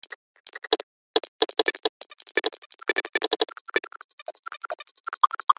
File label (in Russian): prigayushij zvuk chto to skipayut skipping